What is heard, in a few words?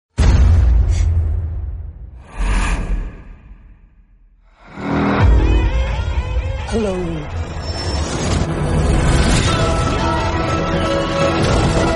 Horror Ringtone; Joker